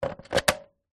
industrial leaf blower electric battery insert into plastic charging dock